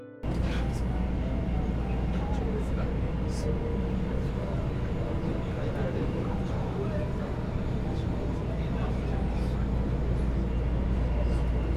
環境音 電車 車内

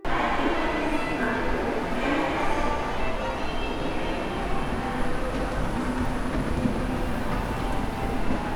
駅 構内; 環境音

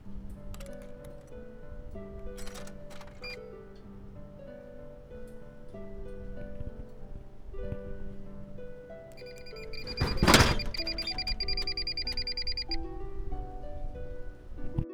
自動販売機（当たり機能付き）コイン入れる〜飲料出るまで

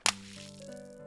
SE 水 風船 割れる

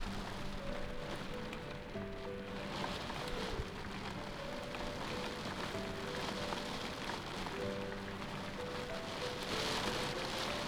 環境音 雨雷 家の中

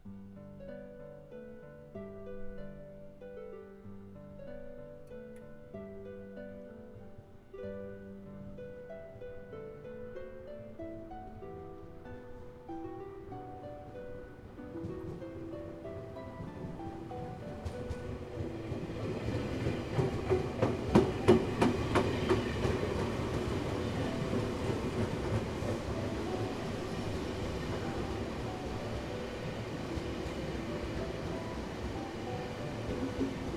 SE 電車低速＋高速通過

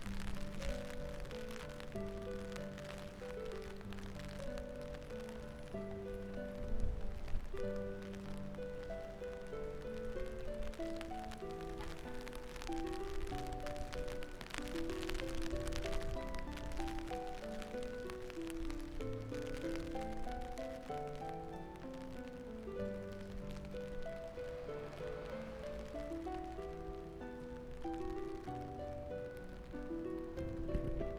環境音 雨 傘に当たる

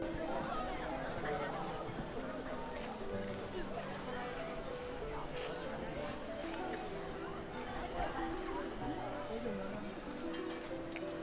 環境音 人混み

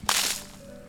水 撒; 水 撒く

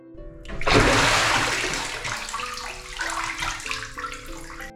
SE 風呂 上がる

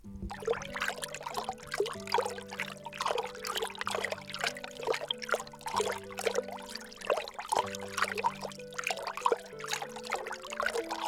混ぜる

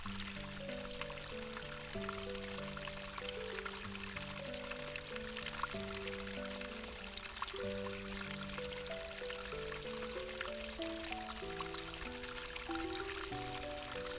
環境音 水が流れる音

